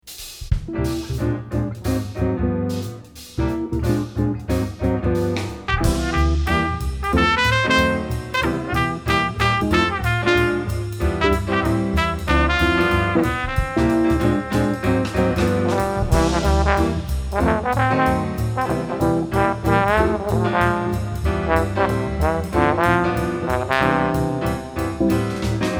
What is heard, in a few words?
trombone